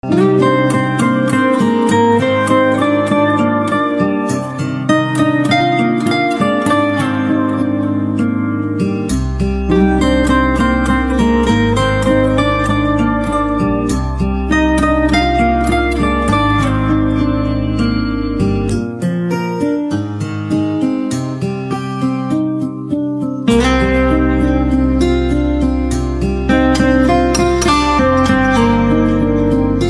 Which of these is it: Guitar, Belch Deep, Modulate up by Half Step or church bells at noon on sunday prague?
Guitar